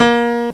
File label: piano 440; fairlight cmi